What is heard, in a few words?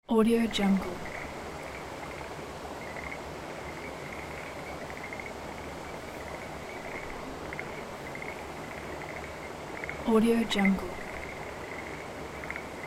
frogs